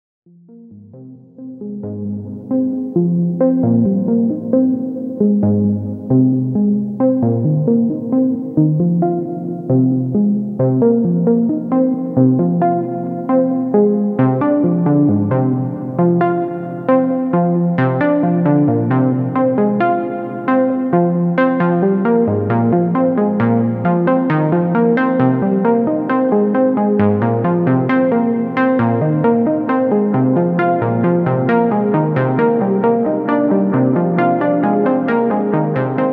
major, Quantizer

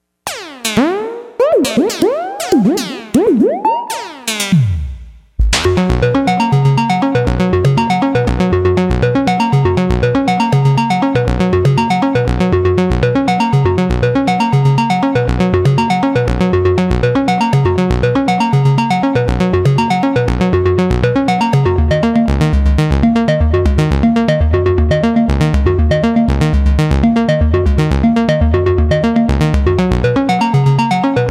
mini wave

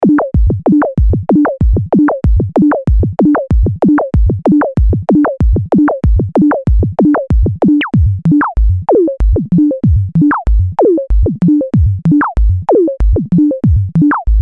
sync LFO; Quad lfo

